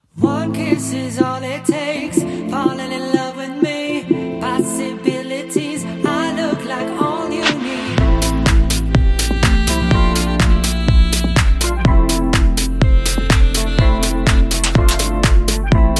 Rock Pop Band

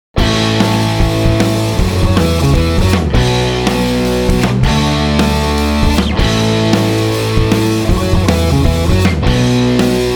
Rock Pop Band; Somerset

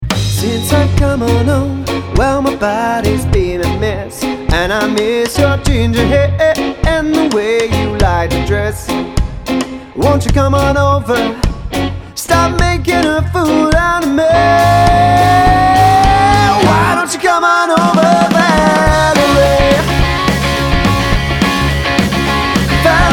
Rock Pop Band; Somerset

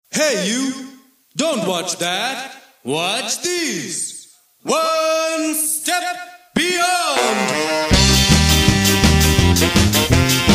Ska Band, North Yorkshire

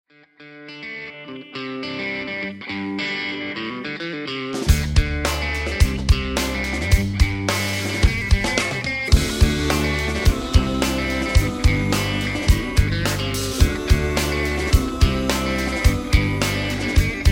Rock And Pop Trio; West Midlands

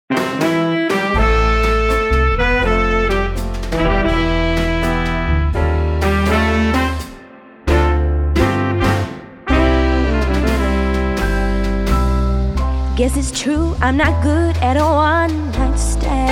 Modern Vintage Swing Band

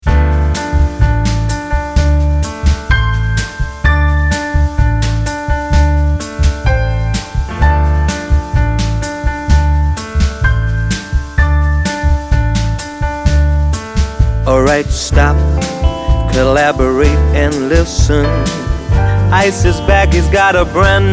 Modern Vintage Swing Band

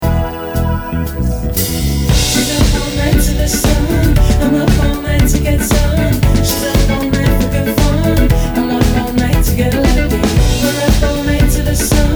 Rock Pop Band; Staffs

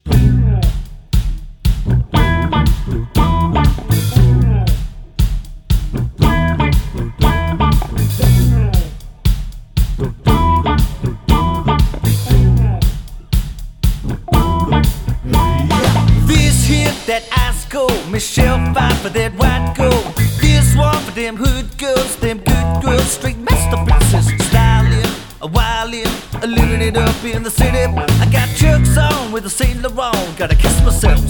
Rock Pop Band

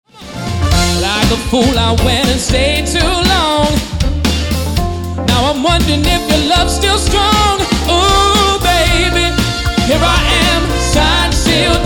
Soul Band; Surrey

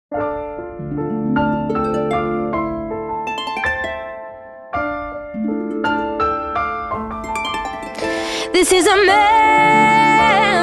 Modern Vintage Swing Band

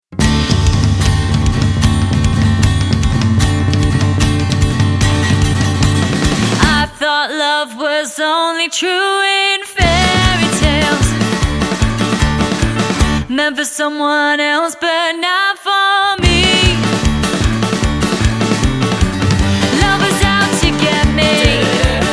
Rock Pop Band; Hampshire

